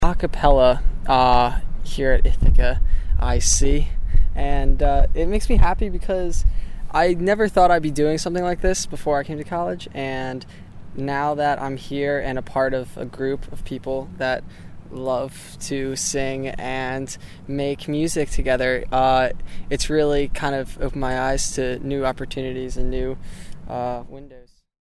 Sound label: ACAPELLA DUDE